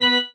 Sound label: marimba grave; ゆめ2っき